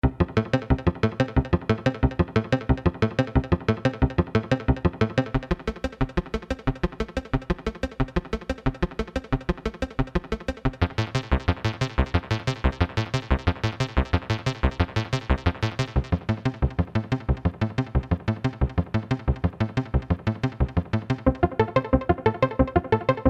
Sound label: Blips